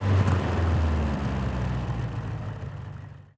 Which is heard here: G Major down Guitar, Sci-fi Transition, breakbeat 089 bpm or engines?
engines